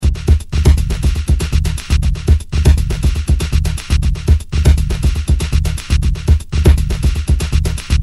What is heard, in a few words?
Batucada; OpenSounds Evangelos